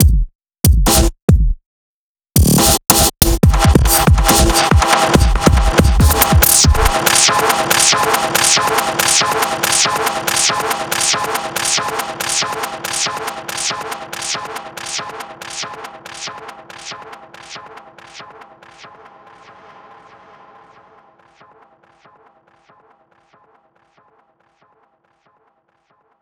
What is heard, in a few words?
drum